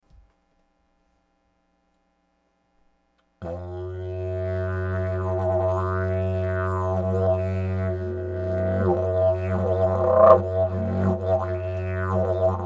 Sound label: Didge Fa